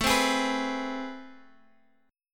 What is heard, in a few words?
A Minor Major 9th, down, Guitar, Drop D